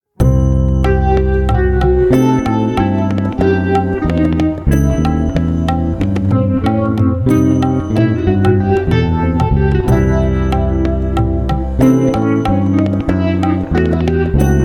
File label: ultralocrian bb6